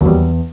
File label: honk